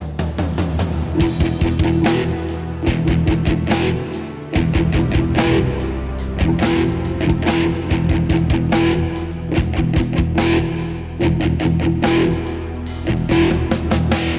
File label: stock music